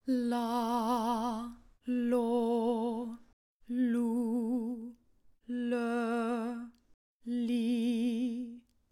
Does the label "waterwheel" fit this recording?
No